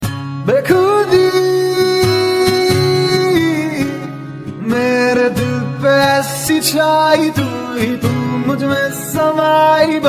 swing ringtone

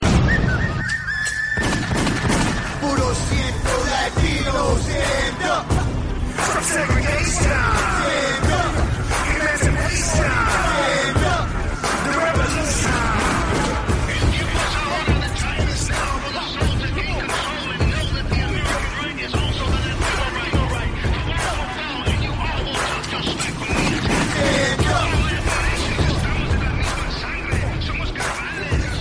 mexican tone ringtone

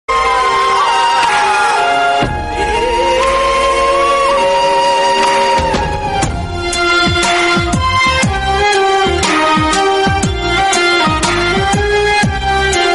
srilankan airtel hallo ringtone

